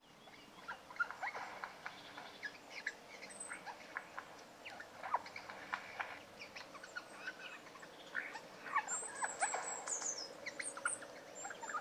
Song

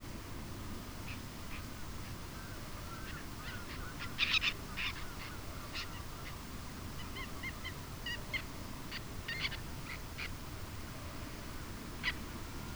chatter kep

